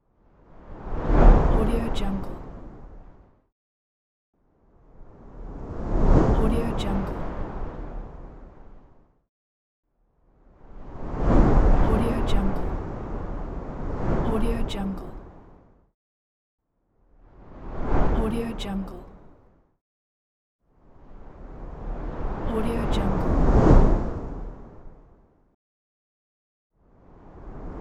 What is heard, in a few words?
whoosh